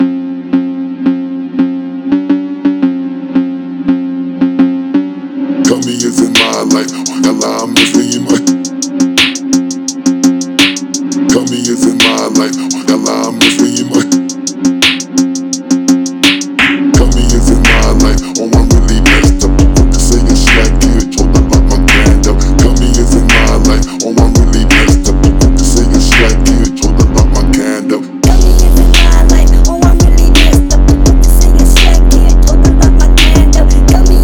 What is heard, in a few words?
Bass Phonk